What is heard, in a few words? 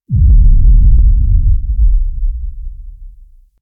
Undercroft Sub Sweetener, Ambience; Warframe; Duviri